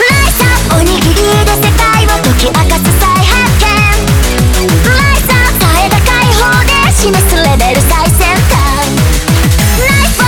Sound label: BGM Reach